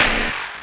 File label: red lightning zap